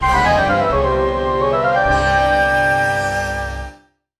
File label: Stage Clear; Prince of Persia; Genesis